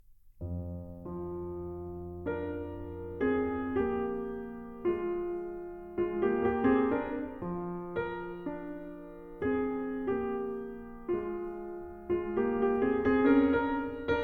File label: Dumka For Piano; Martinů